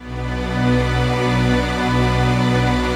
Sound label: b string